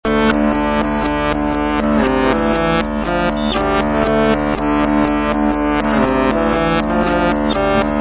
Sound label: 120 acoustic reverse, loops